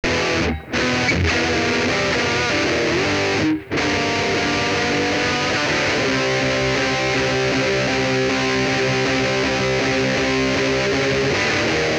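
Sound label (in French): guitare saturée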